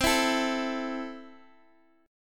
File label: C 6th, Guitar, DADGAD